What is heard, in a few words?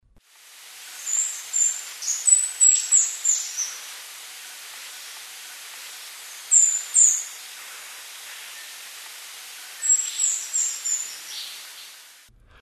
cinnamon hummingbird